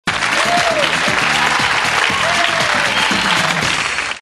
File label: drum